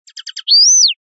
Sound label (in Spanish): rep silbidos, pardillo